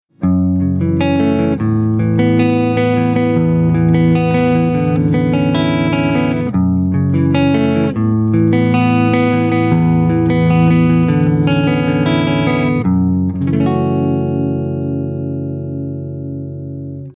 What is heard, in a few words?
ZIPPER SOFT